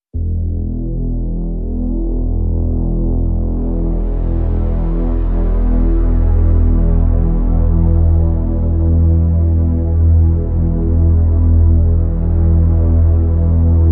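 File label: drone bottom floor E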